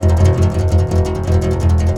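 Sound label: contrabass balalaika, Plucked Strings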